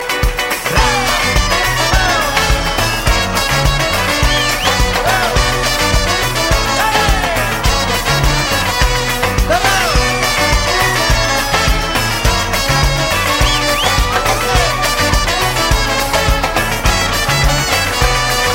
Rumba

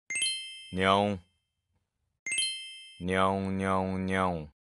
Miau; jumin han

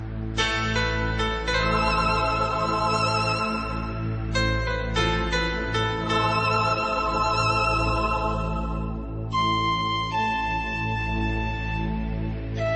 Instrument; Khalnayak

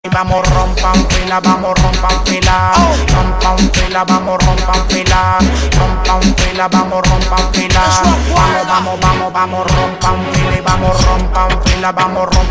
Reggaeton

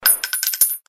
Gun

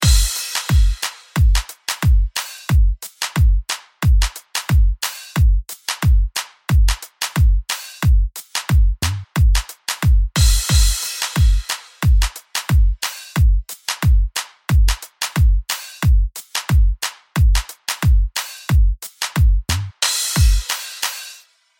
Party drum solo

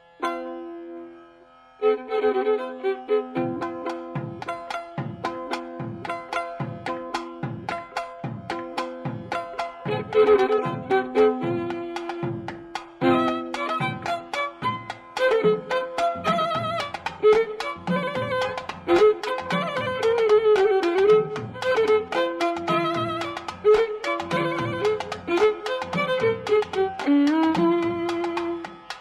Tamil Note

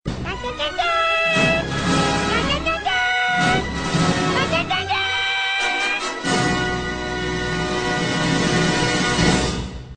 Fanfare; Simpsons

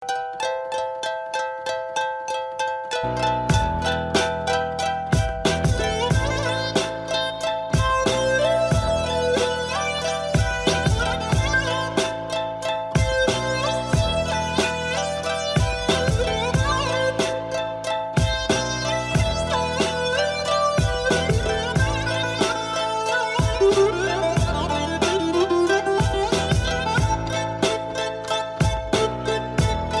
Sad ringing

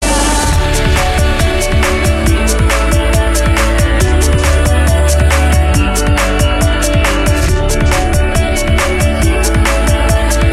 Chillout Trance

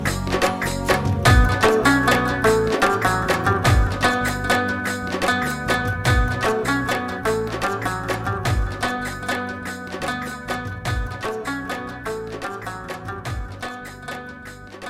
Rabab

